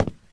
hardwood step; Golden Crayon Sounds

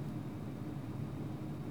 police car idle int; Original TK Sounds

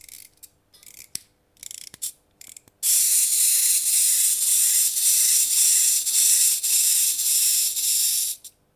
toy; Golden Crayon Sounds

